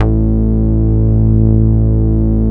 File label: MOOG A2MP; 90S MOOG